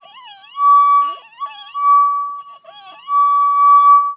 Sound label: Squeaking Squeaking Glass